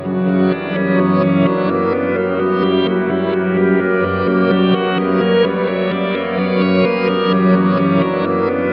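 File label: Ambient guitar Egypt texture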